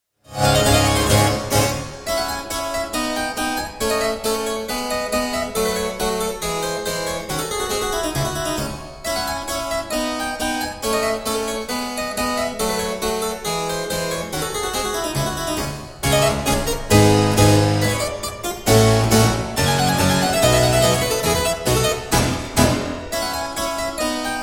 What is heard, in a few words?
tambourin, harpsichord; christophe rousset